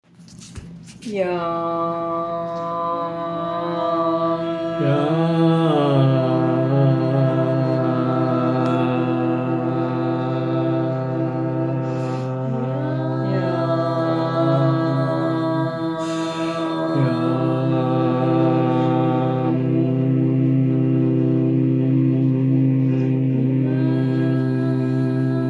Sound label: Yumm Chant 3 voices